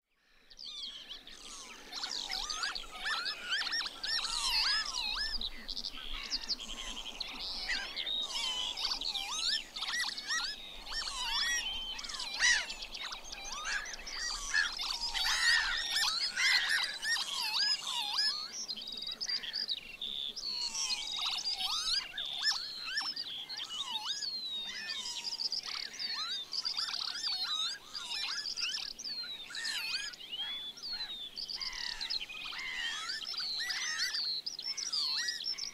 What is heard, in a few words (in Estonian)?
kiivitaja